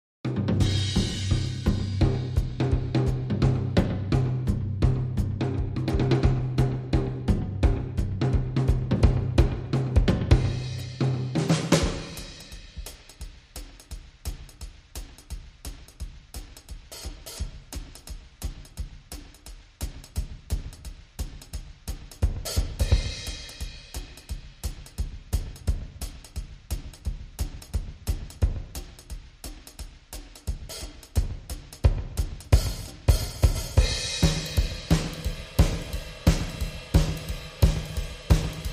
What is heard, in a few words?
Drums Clean